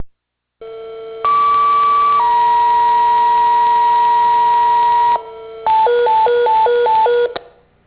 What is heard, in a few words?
tone